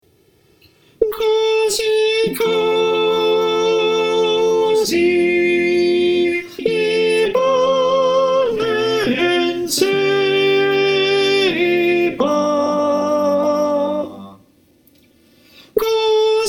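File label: soprano, choir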